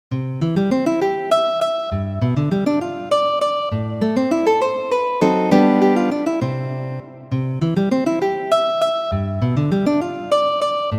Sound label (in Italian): valses, guitar